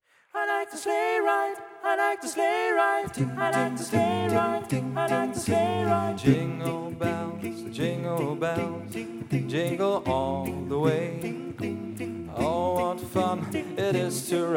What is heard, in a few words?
jingle bells